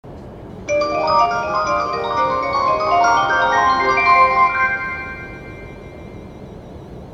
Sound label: chime; kyusyu